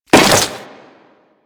Crewman Step Flesh, Footsteps; Warframe; Corpus

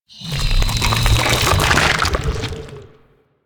Vendor Retract Fast; Ambience; Props; Infested Hub; Warframe; Infested Eris

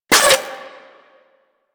Scope Gun Mech; Crp Scope Gun

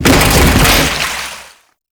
Bodyfall Water

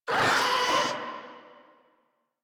Infested Charger Death, Enemies; Warframe

